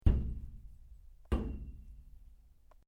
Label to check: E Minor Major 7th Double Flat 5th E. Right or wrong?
Wrong